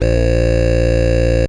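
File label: synth bass, drum and bass, 160 bpm, C; eJay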